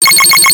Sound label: pet chime; commodore pet startup chime